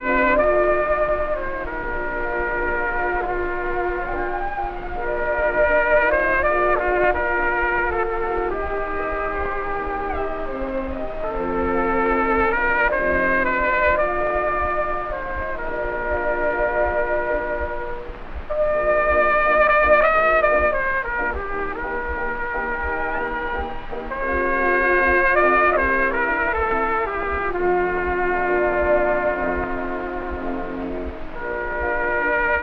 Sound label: trumpet solo with orchestra, saxophone